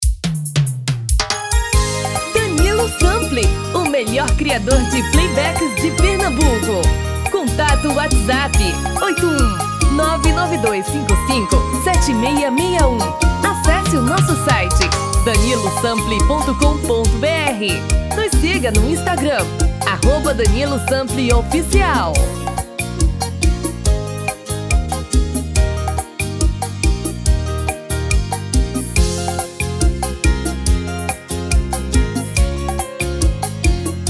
Serestao

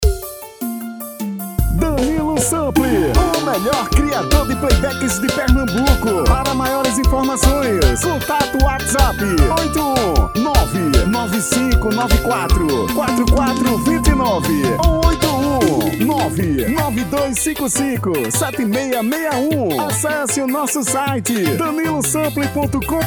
Piseiro

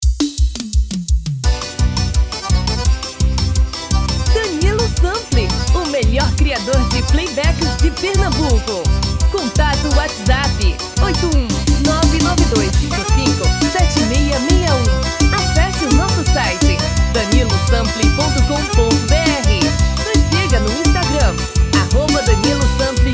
FREVO

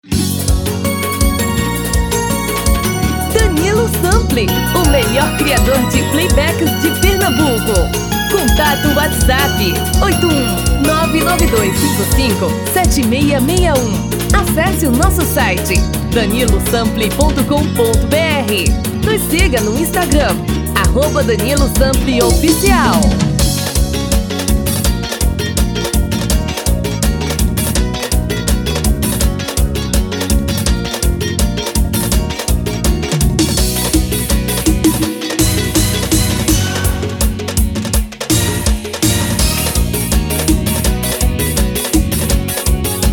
ELETRICO tom feminino

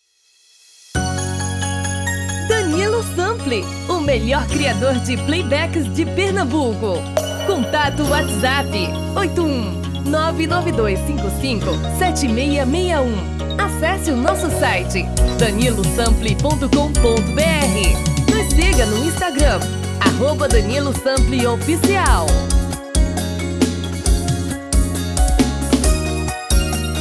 Brega TOM FEMININO

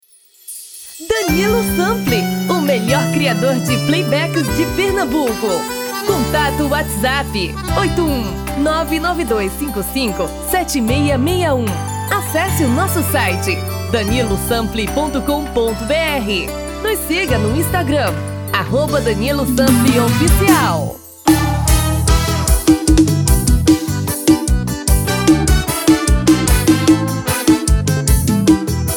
Forro

